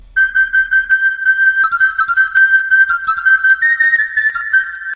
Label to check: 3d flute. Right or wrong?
Right